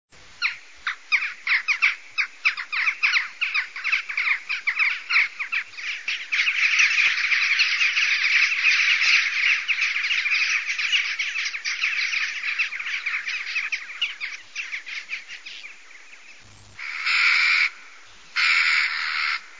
canto grajilla; aves campo

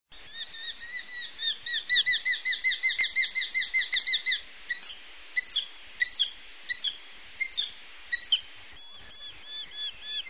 canto alcotan; aves campo

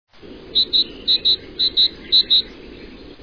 canto Carbonero Comun

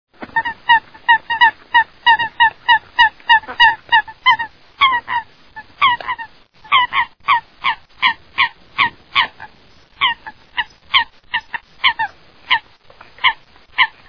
canto Focha Comun

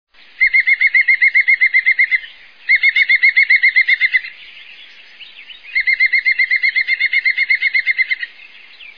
canto Pito Real

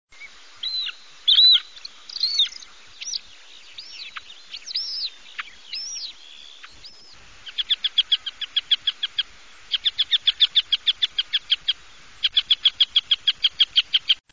canto aguilucho cenizo; aves campo

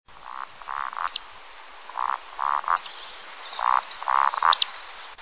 canto chocha perdiz; aves campo

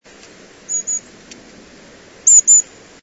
canto Mito

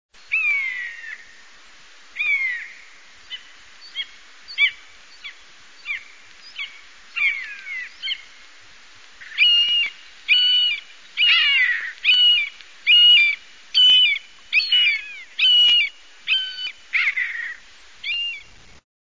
canto ratonero comun; aves campo

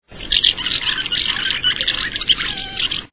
canto avion comun; aves campo

